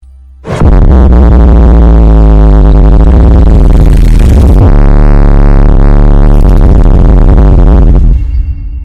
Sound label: block bass